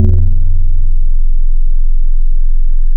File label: marimba synth